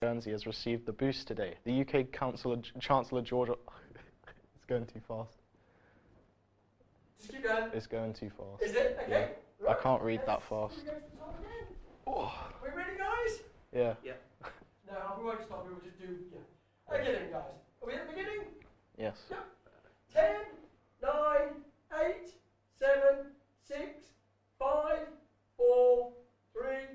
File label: Studio Recordings